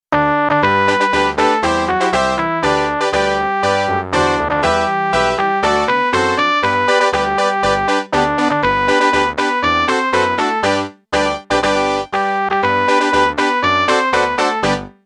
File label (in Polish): marsz artylerzystow